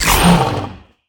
activate; Smite; god Kukulkan v5

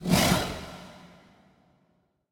fire; smite; hades

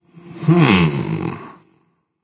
thinking; smite; ahpuch

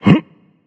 grunt jump; smite; tyr

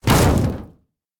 bulk metal hit; Smite; Cabrakan

